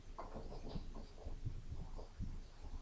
wheel

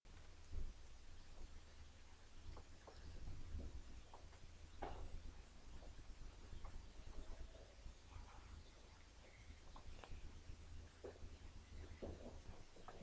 jaguar

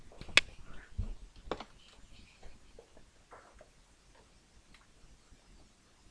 splash

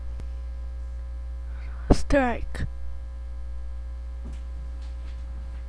strike

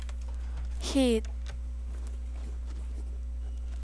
hit

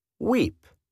weep

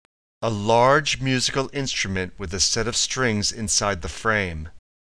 harp

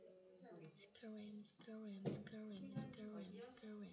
scream

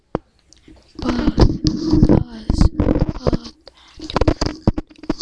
buzz

